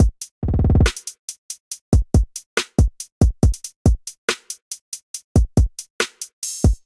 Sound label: Kit, Trap Drums; Horizon Kit; Trap Drums by Sound Oracle; Ableton; Factory Packs